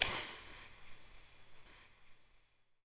bomb, weapons

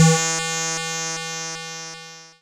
static, Retro Sounds 32-Bit

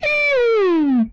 Sci Fi Gun, retro arcade; Guns; MMRetroArcadeSoundsPack

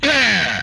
attack, vocal; retro arcade; MMRetroArcadeSoundsPack

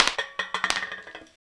Drop Tabla; FREE SFX PACK VOL 3

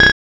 Computer Drill; FREE SFX PACK VOL 2

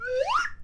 Quick Rise, Slide Whistle

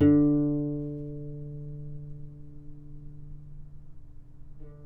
D3 - Pluck, novice cello

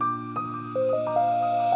Reverse, drums

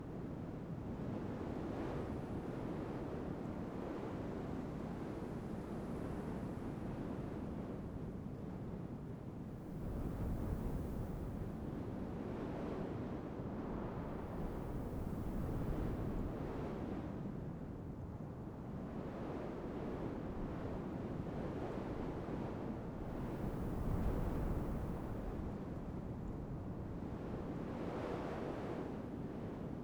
Ambiance Wind Calm Loop Stereo; Nature; Essentials Series NOX SOUND

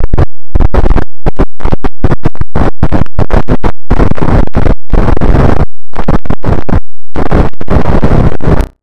Retro Interferences Long

